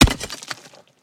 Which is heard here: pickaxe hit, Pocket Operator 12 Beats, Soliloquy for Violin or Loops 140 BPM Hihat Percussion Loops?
pickaxe hit